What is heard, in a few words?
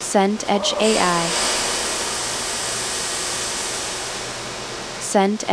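ambience swimming pool indoor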